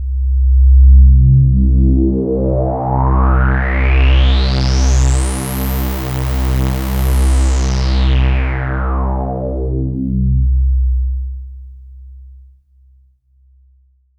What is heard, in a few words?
SWEEP TO; E-MU Producer Series Vol. 6 – Analogia Project (CD 1); Analog Rhythm; Effecks Trip